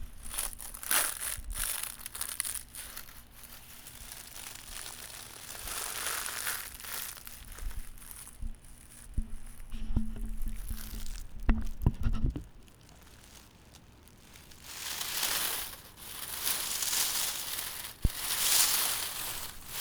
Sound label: Foley